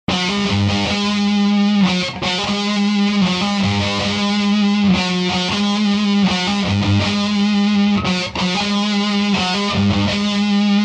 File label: badcat double gain